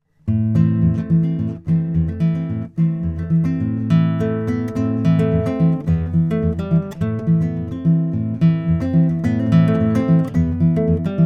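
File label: lowden s50j ziricote, guitars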